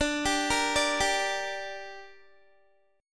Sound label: D Suspended 4th Sharp 5th, Guitar; 21,22,22,19,19,x; arpeggiated; 4F,4A,5C,5G,5C,6E